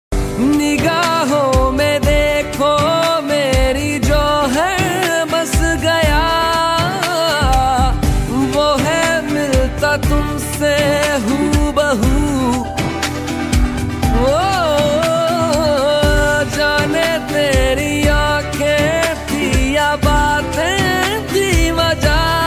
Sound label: romantic song, heart touching love ringtone